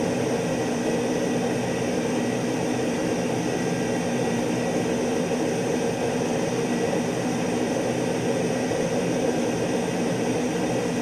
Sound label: Su27 In He Amb R; DCS